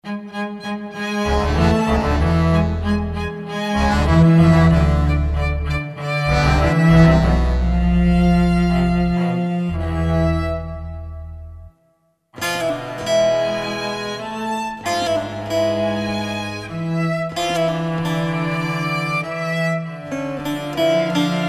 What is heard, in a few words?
cello baglama variation